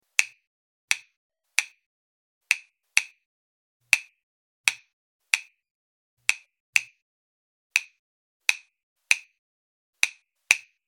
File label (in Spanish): claves; reciclar tocar